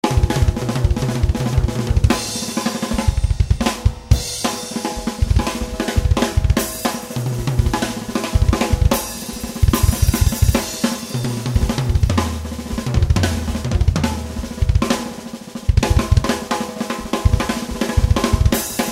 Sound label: drum